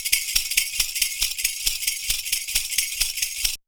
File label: PERCU; Univers Sons; Raricussions